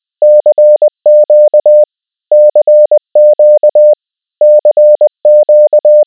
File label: CW 20wpm